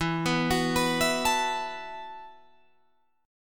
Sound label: E Suspended 2nd Suspended 4th E, down, Guitar, Drop D